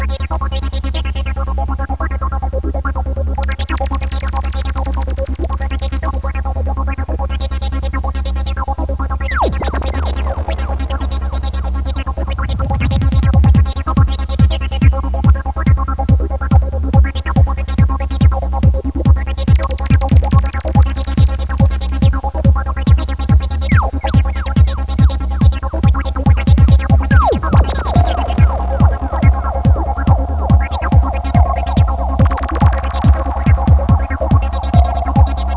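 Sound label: industrial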